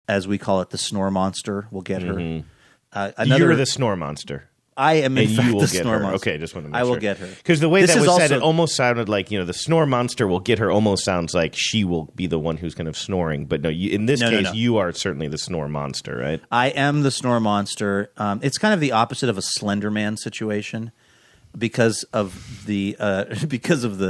snore monster snoring machine

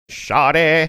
singing shorty

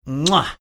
making chefs kiss sound

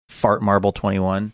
fart marble

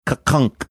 mimicking mechanical sound cassette players

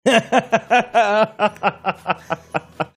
awesome laugh

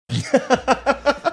snorting laughing

